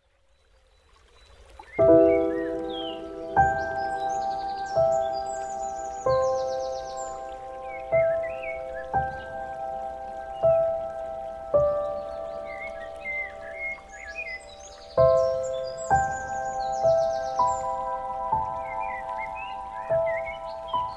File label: Birds at My Door